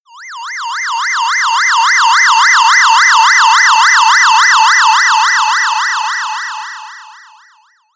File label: police